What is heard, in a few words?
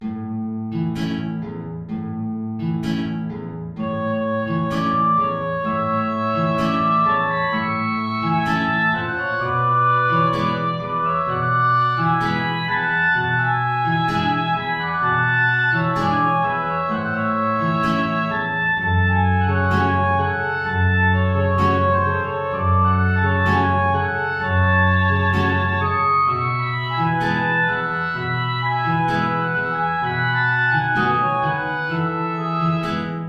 tango; albeniz isaac